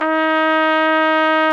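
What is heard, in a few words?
trumpet mf; Solo Brass; AKG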